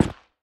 movement step; smite; odin

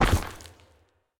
movement step; smite; god odin

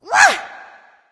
yay; smite; voicepack; scylla

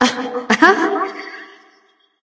surprised light; smite; voicepack; hel

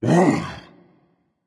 ugh berserker; cuchulainn

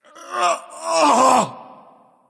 ouch; smite; ares

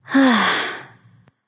sigh; smite; pele

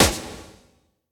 Defeat Snare; smite; raijin

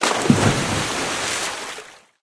recall dive; smite; poseidon

